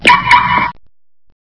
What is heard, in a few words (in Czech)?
dalkove ovladani auta, Dopravní prostředky